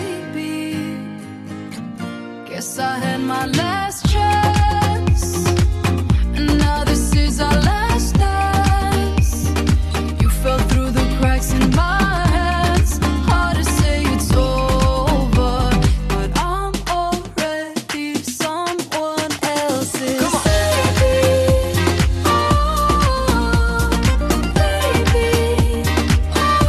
ring tone

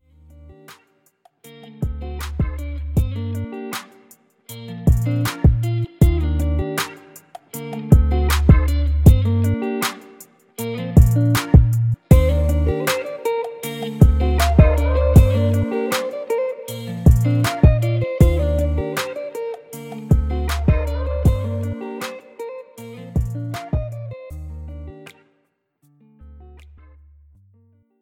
Romantic Guitar Ringtone

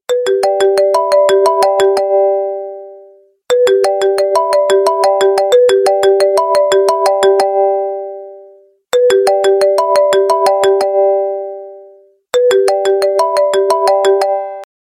Oppo Future Ringtone

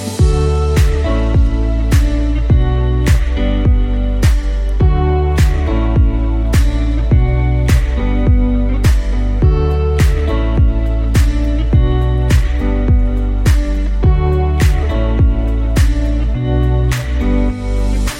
Huawei Ringtone

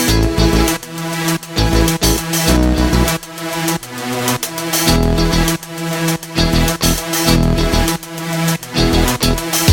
Oppo Reno3 Pro 5G Ringtone

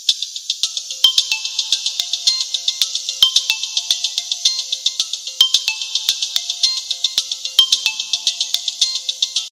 Oppo Ringtone

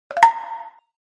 OnePlus Notification Ringtone; Incidence

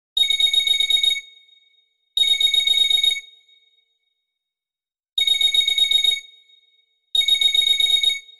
Bell Ringing Alert Ringtone; Infinix

